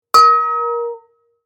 Singing glass impact single hit

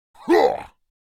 Orc creature fighting vocalization

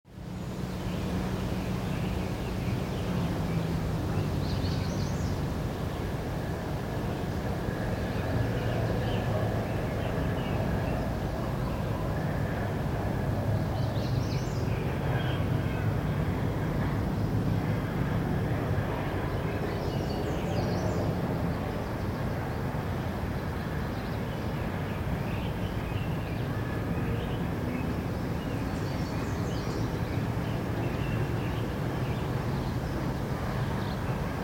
Suburban street ambience light traffic

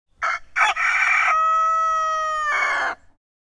Village rooster crowing distant perspective

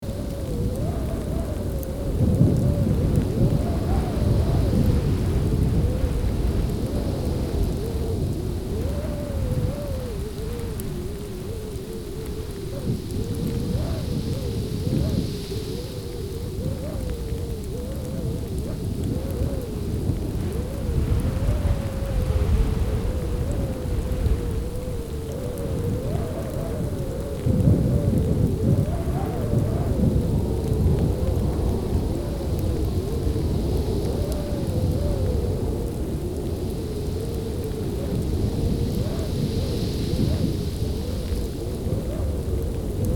Icy snowstorm wind

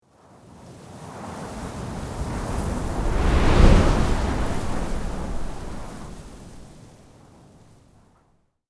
Desert strong wind blow sand and dust; nature

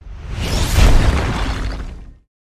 Superhero landing heavy

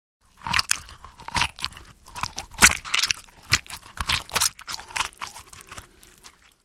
Ravenous eating noise slurping and chewing

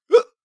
Adult man hiccup single

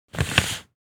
Plastic bag remove item; gfxsounds

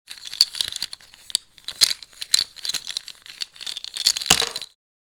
Plastic toy handling objects movements; gfxsounds

